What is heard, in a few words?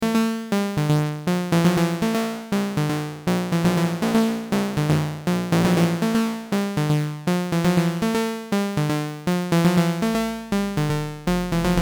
sytem1m noise saw